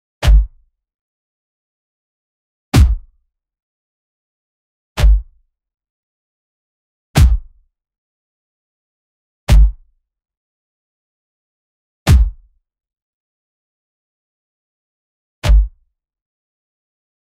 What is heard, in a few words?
all sounds from hit, impact